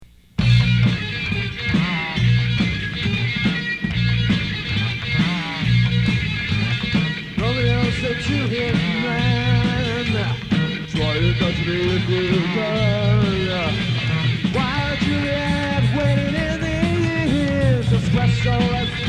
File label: Weird Blues